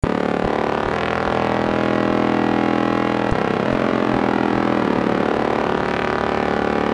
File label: noise